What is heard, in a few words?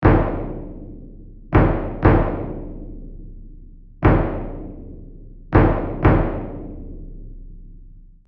Bass drum simple rythm